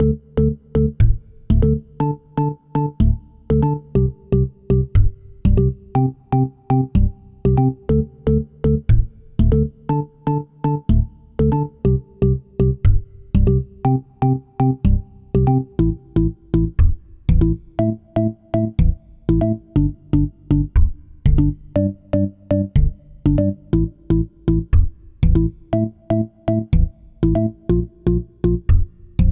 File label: Quirky Puzzle Game Menu